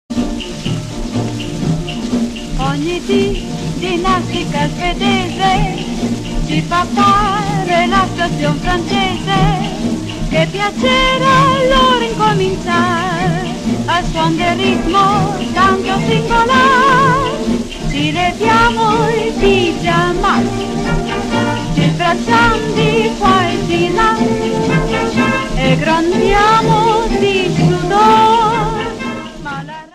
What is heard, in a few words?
samba; anteprima